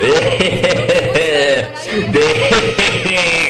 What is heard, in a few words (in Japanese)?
warai